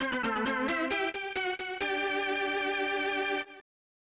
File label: Baseball Fanfare; Ken Griffey Jr. Presents Major League Baseball; SNES